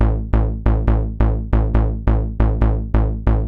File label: POWER PULS, Techno 138 BPM; Best Service ProSamples vol.54; AKAI